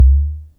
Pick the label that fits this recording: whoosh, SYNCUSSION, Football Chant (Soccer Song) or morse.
SYNCUSSION